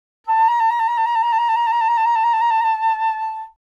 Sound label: Flute; 気鳴楽器; AirReed